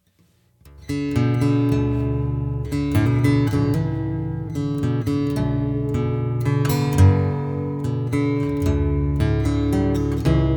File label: Baritone, guitars